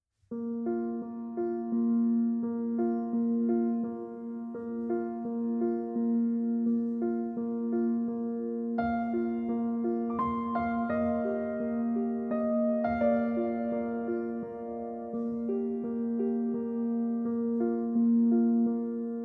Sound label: solo piano